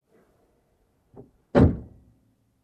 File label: Closing Trunk